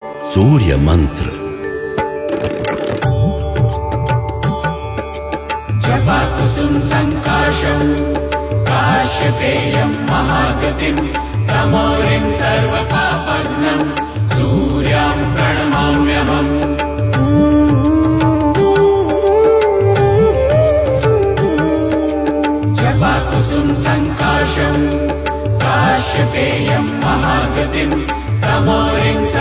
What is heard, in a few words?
Navagrah Mantra